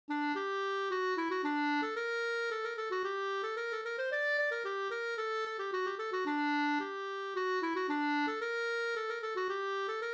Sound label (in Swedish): polska Senpolska